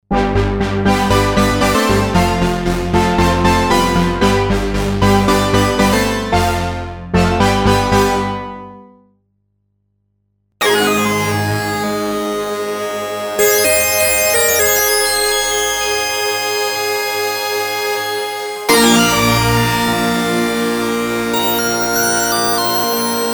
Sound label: 4voice